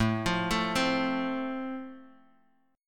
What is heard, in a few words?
A Minor Sharp 5th A, Guitar, Open F; x,4,5,4,3,x; arpeggiated; JGuitar